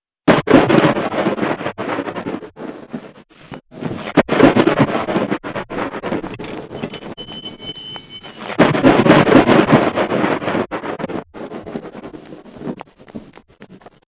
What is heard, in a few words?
storm